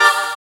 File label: SYN VOICE, dance synth; Sound & Vision - Gigapack I CD 2 (Roland)